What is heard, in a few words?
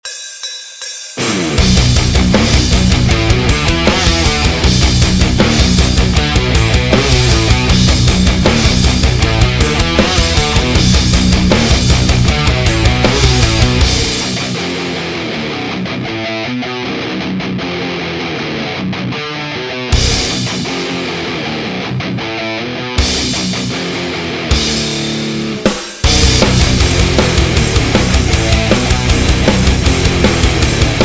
Drive Nu Metal